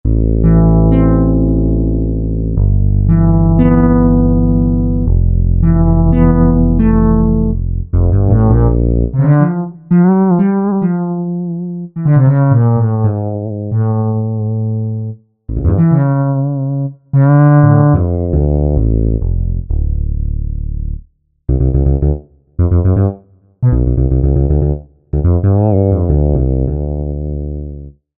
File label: Thick Fretles